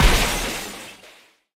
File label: Impact Wall